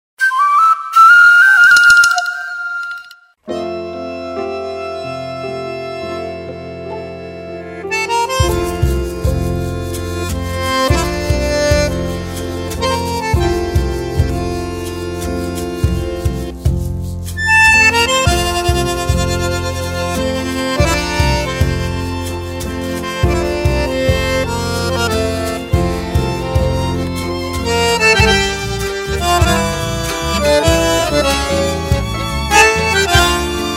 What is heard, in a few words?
Galopa Gualambao Instrumental Bandoneon